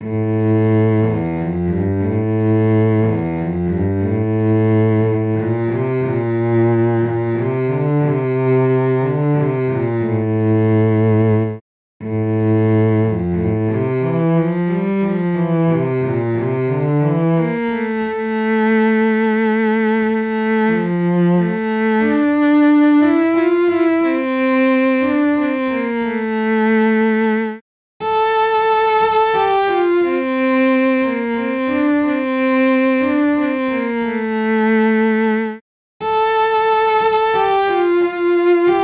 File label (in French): nostalgie cello; 2019 rimailleurs